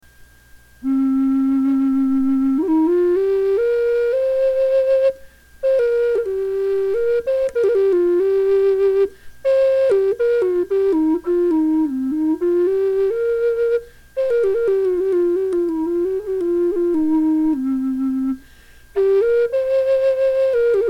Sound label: Deep Bass Flute; windflowerflutes